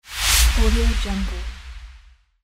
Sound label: whoosh transition; archisound